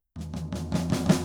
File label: Drums (Intro Fill), Blues; Digidesign; Pro Tools; Pro Tools Essential Demos; Essential Loop Demos; Demo Session